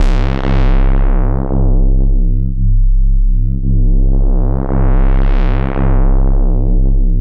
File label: BASS C2, Jungle; Explosive Jungle; USB Soundscan vol.05; AKAI